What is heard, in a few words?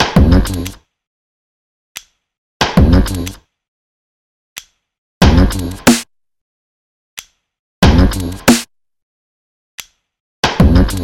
Iphone BeatMaker